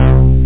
BASS SAM; Groovy Bytes: Behind the Moon

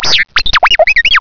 R2 DANGR; XWINGTIE

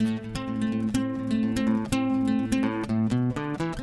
ACST GUIT ETHNO 125 A, Guitar; eJay

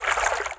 WATER; anito

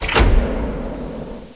rune use, doors; Quake

